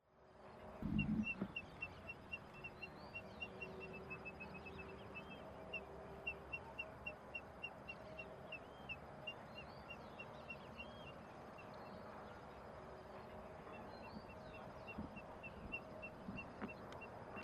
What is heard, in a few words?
Nothura maculosa Codorna amarela; Jacauna